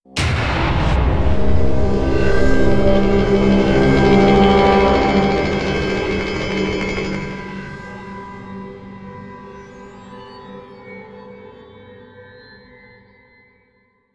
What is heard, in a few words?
Mission Music Creep Stinger; starcraft 2 wings of liberty